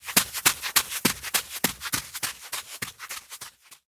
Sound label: Run sand